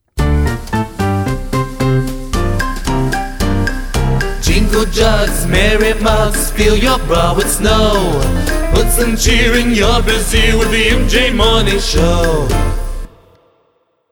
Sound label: jingle theme